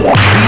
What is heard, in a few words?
crack